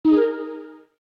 Haze, notifications

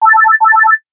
Bell Synthetic; Motorola Bravo; ringtones

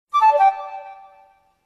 Flute NO bird close; Nokia; 7370 Asia

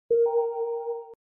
Open, Event sounds; LG KF900 Prada II

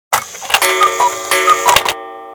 music clock bird twice, alarms; Samsung GT-i7680; cmcc

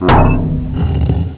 rock ball fire; Nokia; 5800 XpressMusic; Bounce Touch

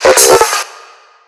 Fragmented alert, notifications; Motorola Droid-4

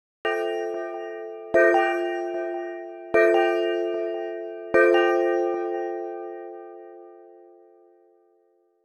Ding Dang, ringtones

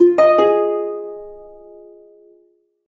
Relaxed Melodious; BlackBerry 9000-Bold

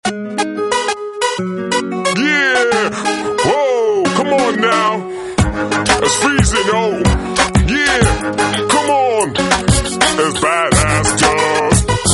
Hip hop Guy; Samsung GT-M2710 BeatTwist

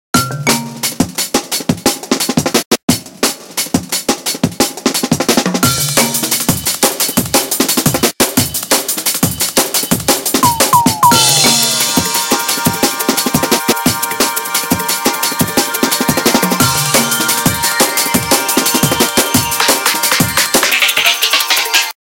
Bustle, Nokia Tones

